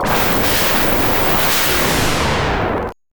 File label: Explosion; rambo iii mega drive